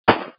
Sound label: splat soft